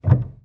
piano

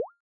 modal open

